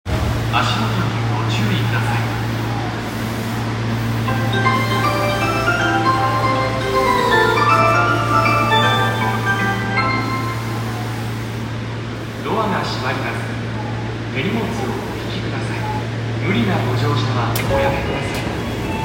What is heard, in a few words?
train sounds; 有楽町線市ヶ谷西